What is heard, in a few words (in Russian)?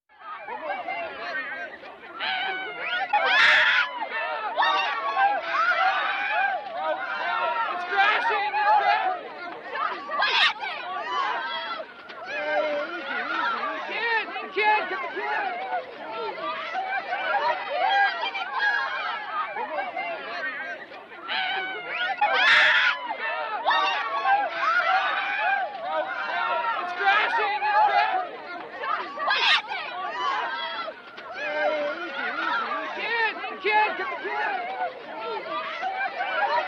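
звуки с криком горящего человека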